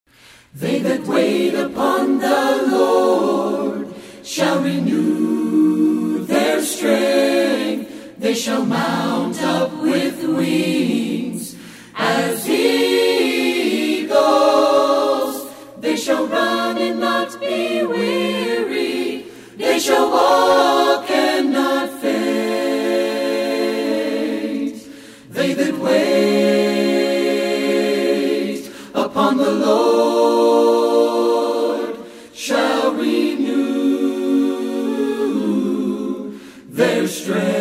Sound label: Acapella; Choral